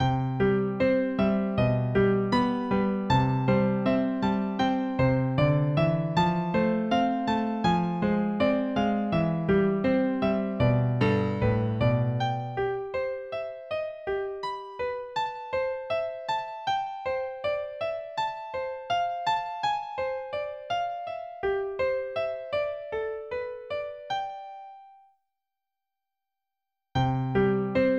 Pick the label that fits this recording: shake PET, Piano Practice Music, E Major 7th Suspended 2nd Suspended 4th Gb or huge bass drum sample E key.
Piano Practice Music